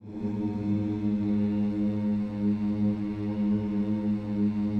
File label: HMMS G#2, gospel; Spectrasonics Vocal Planet